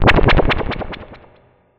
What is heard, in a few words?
cry